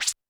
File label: Roland TD-20 Percussion